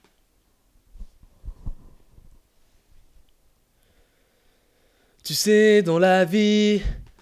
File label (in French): Voix principale